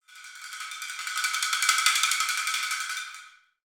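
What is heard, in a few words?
rotating drum, perc fx; Roland LCDP14 Africa VOL-2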